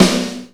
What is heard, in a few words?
Snares; Vengeance Samples; VEH2